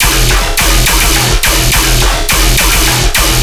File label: Bass Loop E 140BPM, Dubstep